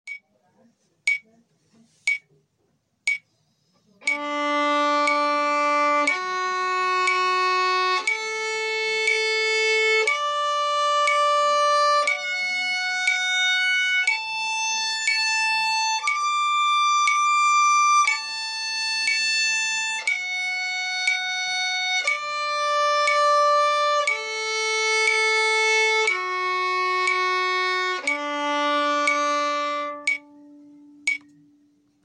Arpegio de re mayor con metronomo

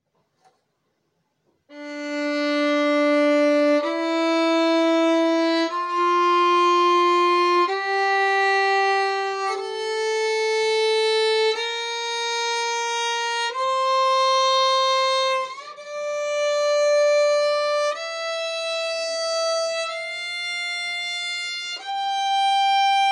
Escala de re menor

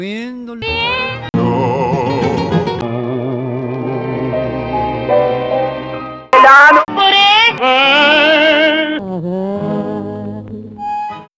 Glissando ascendente

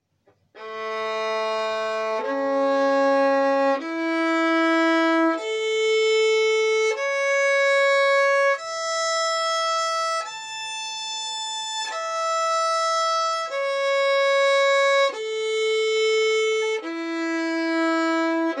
Arpegio de la mayor

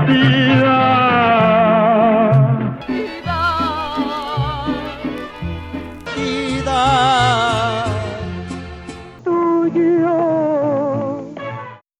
Glissando descendente

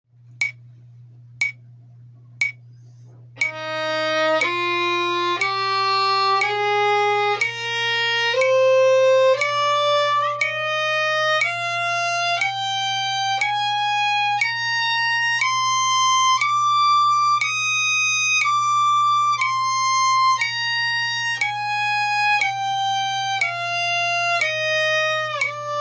Escala de mi bemol mayor con metronomo

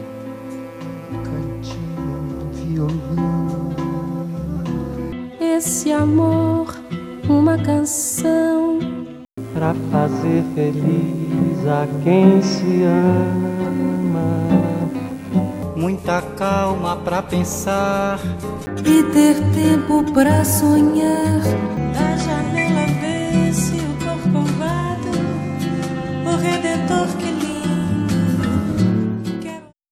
Bossa nova

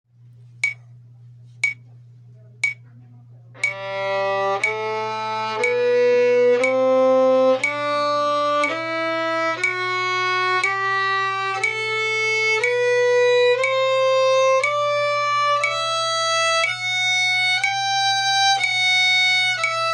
Escala de sol mayor con metronomo

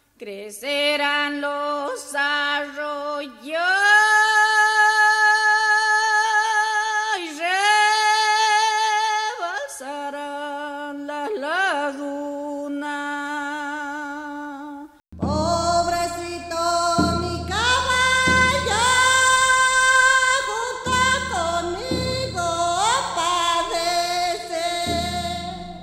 Sin falsete

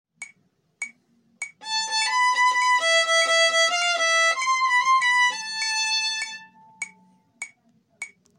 Extracto B Normal con metronomo, cumbia

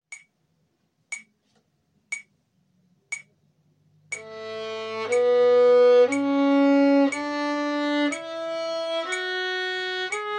Escala de la mayor con metronomo

